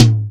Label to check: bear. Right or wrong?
Wrong